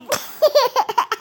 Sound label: notification